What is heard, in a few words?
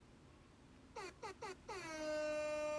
Cheers